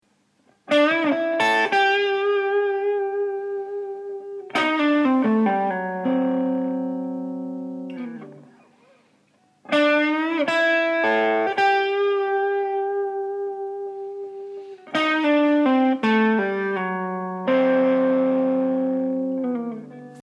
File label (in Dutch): voorbeeld lick landen op akkoordtonen